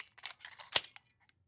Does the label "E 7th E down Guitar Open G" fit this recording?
No